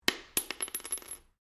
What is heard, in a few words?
Cartridge 22 Caliber Long Impact Polished Wood Stereo; shell casings of different calibers impacting on different surfaces